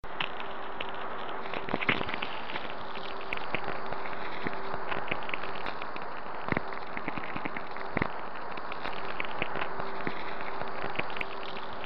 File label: Radio Collage Noise Research